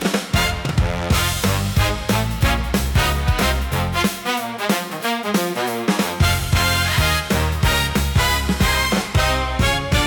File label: Grand Entrance Groove, big band swing gorgeous showtime